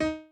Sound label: piano chord